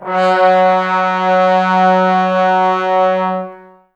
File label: BRS TBS F#, Woodwind Brass Ensemble, Brass; Miroslav Vitous - Woodwind Brass Ensemble